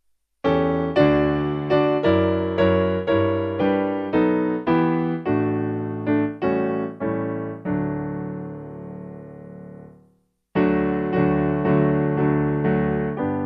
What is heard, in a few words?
Piano